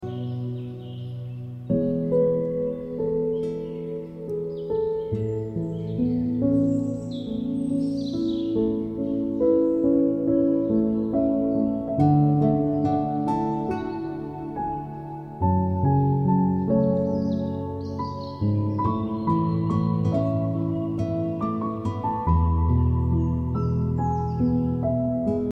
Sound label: samsung new ringtone